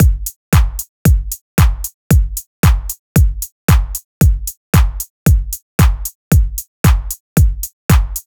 リズムパターン

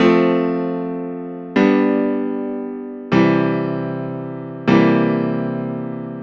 Ⅳ→Ⅴ→Ⅰ→Ⅰ7進行（F→G→C→C7）

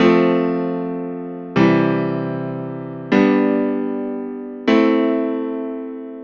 Ⅳ→Ⅰ→Ⅴ→Ⅵm進行（F→C→G→Am）

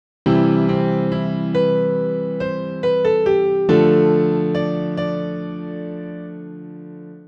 メロディー

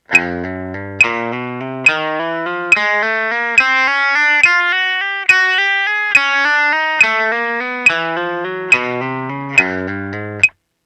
ハンマリング練習

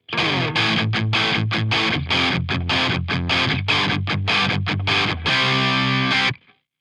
パシフィカ Drive; yamaha pacifica 612

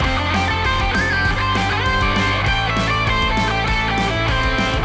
アレンジテクニック ペンタトニックスケール

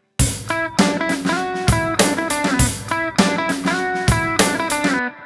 アドリブ

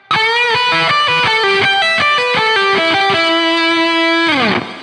ギターフレーズ; プロっぽく聴こえる

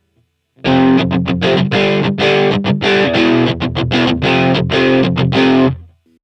マーシャル ブリッジミュート

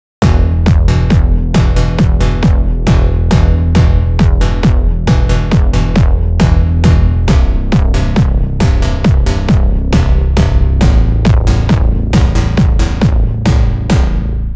ベースの打ち込み

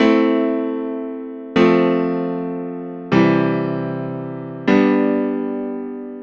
Ⅵm→Ⅳ→Ⅰ→Ⅴ進行（Am→F→C→G）

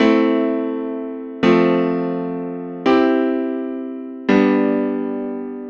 Ⅵm→Ⅳ→Ⅰ→Ⅴ進行（Am→F→C→G）

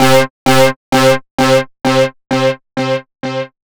ディレイ

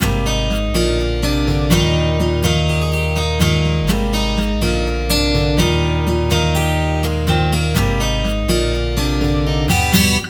アコースティックサンプル

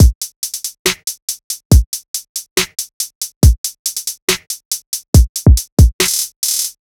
ハイハットの音作り

